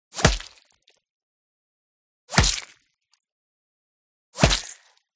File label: BULLET Small Impact Body; WEAPONS; smartsound